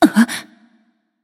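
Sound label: nonverbal surprised; smite; voicepack; izanami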